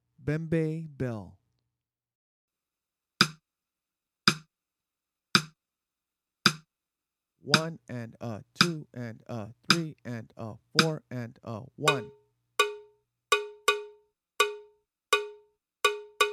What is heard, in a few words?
Bembe Bell; bembe conversations